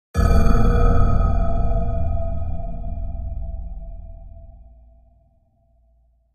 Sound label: Bell Bell Chime with Reverb